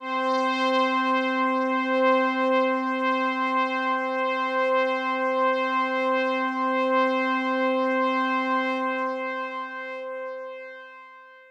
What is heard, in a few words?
Pad C, Synth Prophet One Shots; Electronisounds